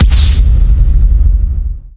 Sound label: Vehicles; unreal development kit; Manta